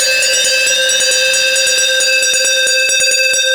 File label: Kaossilator gated (130BPM); musicradar